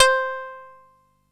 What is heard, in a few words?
koto C4; East West; Global Sounds